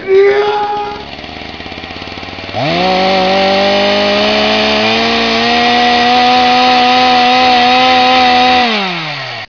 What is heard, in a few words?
chainsaw